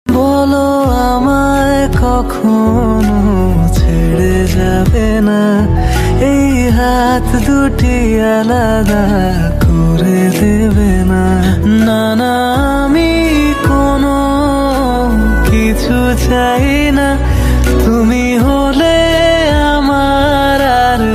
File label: Bengali Ringtone